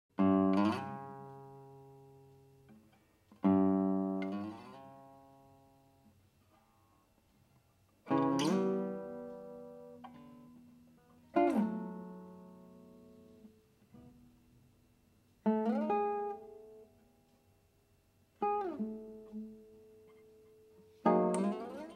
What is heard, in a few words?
Glissando